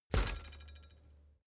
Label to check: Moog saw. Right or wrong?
Wrong